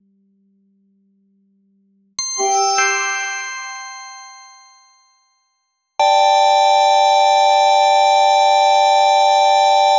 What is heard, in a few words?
pixel atom c18 bit chime